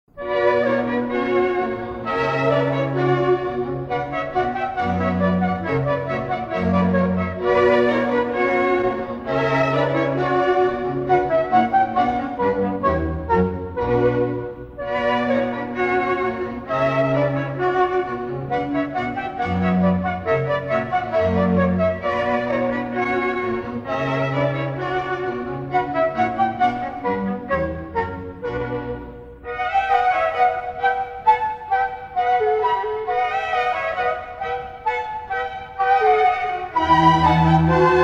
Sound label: Instrumental